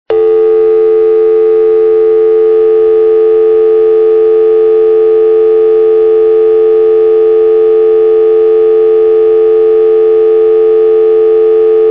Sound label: dialtone